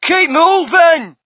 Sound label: crowd, shout; Mike Tyson Heavyweight Boxing